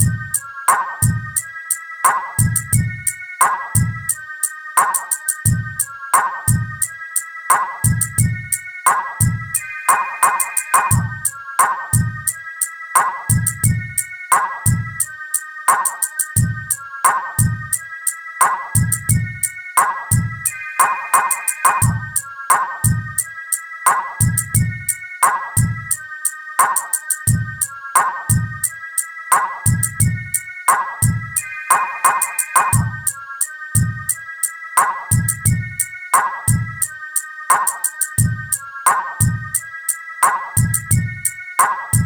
Song

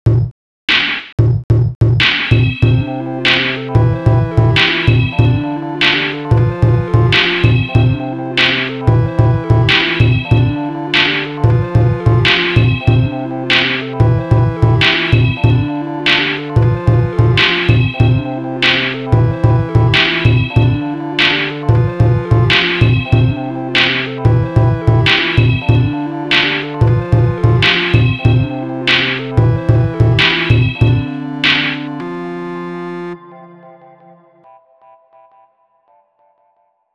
Sine Wave

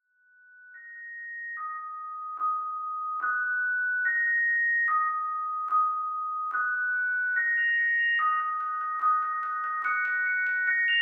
Sine Wave

